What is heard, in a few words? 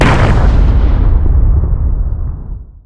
echo stomp cast; weapons; dota; elder titan